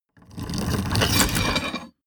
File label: mechanics small rattle